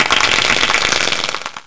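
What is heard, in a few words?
applause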